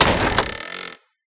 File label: Open; Life of a Naomi